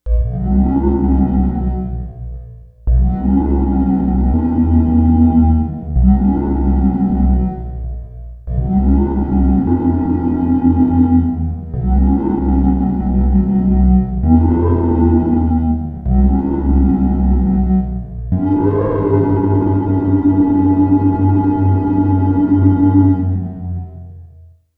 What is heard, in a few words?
Prophet 5